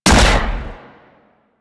Railgun Shot; Weapons; Warframe; Archwing

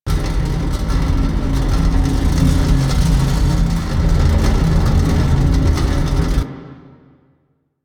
Temple Door Loop; Warframe; Harrow; Red Veil Temple

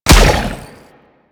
Launch Pad; Warframe; Ambience; PVP; CTF Orokin Derelict

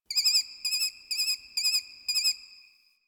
Tree Sparrow Hawk; Warframe; Ambience; Nineteen Ninety Nine

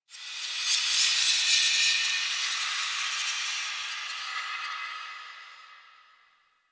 Metal Whispers; Warframe; Quests; TheNewWar; Ambience; Zariman

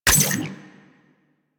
Shape Blast Shotgun Reload; Warframe; Weapons; Corpus

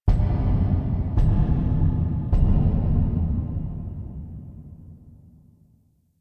Misc Knocks Large; Warframe; Ambience; Entrati

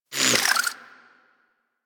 Pistol Proj By; Warframe; Tenno; Guandao

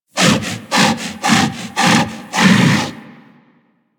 Kubrow Armored Vocal Breath Run, Enemies; Warframe

